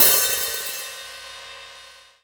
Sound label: XV Hat O, Roland XV-5080